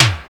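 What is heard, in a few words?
CYBER SMACK, SNARE; Drums In Your FACE!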